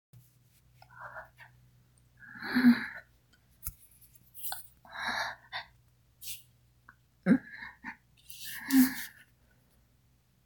wet noises, two orgasms, needy, a tiny bit of begging; F4A